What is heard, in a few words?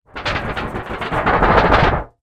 foley tin lid shake wobble; zapsplat